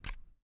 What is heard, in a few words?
Flash; MiSide